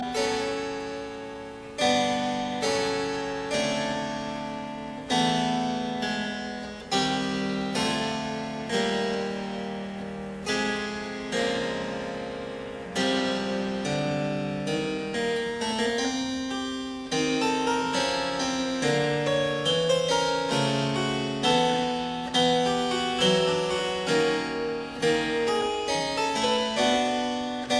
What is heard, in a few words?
Harpsichord Sonata No 1; Persichetti; Op 52